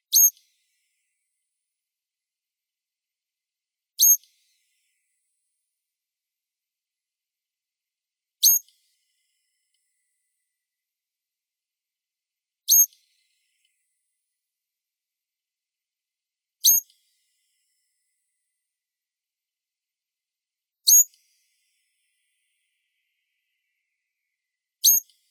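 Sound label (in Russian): pischuha severnaja, Eurasian treecreeper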